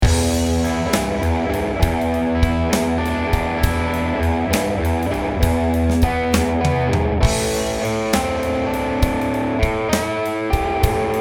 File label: Dirty Studio